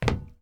Footstep Metal Crouch

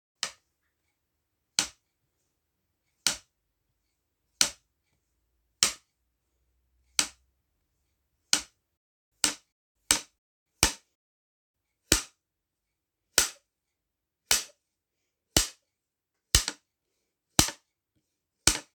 Clapperboard Slating

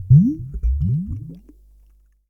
Strange Bubbles Bulles Etranges

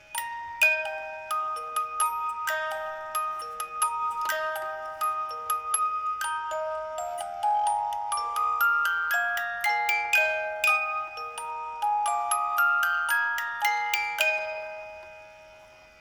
Music Box Loop Slow

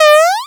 Jump

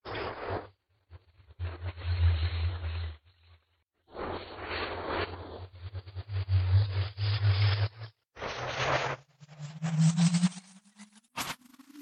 Tape warp

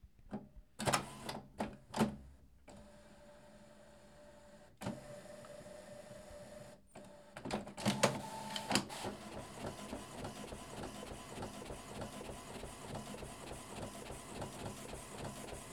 Printer

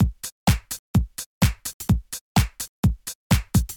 House

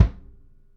Kick Med Vol

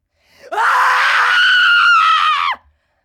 Horror Scream

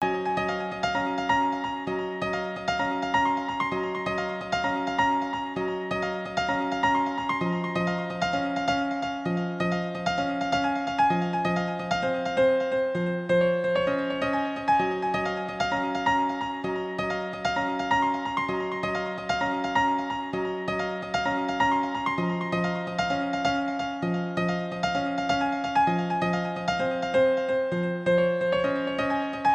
Hallow Lights Piano Loop

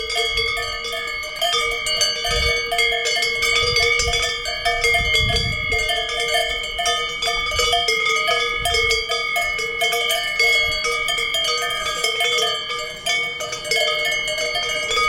Cowbells

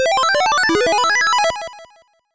Power Up 8 Bit

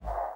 Whoosh Soft

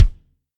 Acoustic Kick

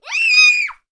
Panic Girl Scream

